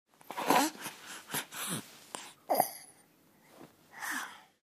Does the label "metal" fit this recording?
No